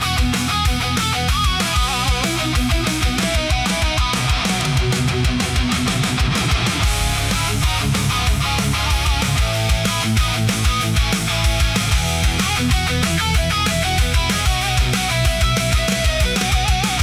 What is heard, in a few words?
hard rock for powerful